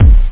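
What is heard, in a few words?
bass drum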